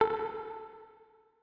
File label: fireworks, bubbles